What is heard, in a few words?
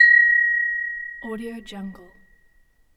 ding